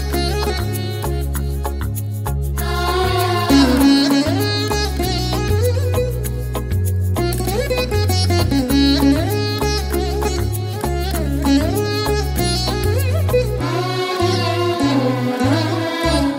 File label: telugu music ringtone